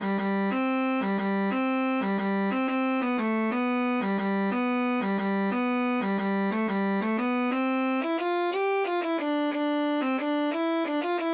violin

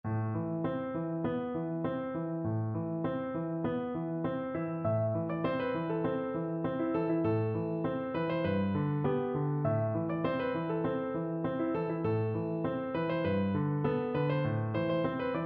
Piano

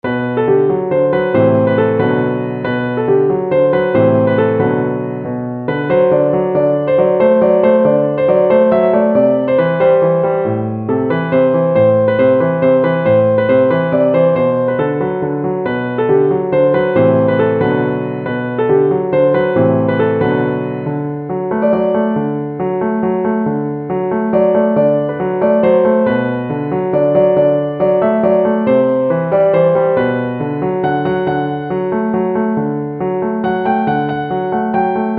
piano

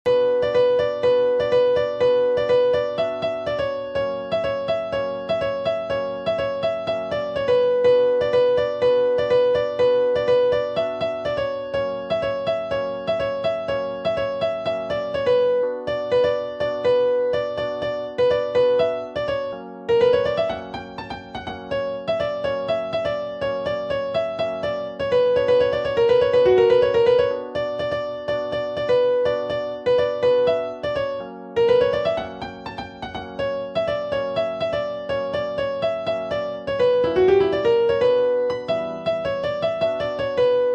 keyboard

